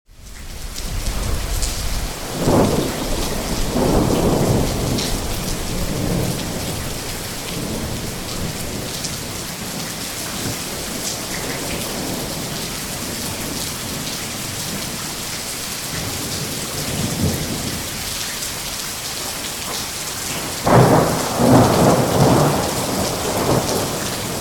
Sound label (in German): Der Klang der Natur - Regen mit Gewitter